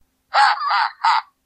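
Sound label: Wii Remote Sound; Wario; Wiimote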